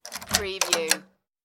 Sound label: apartment door unlock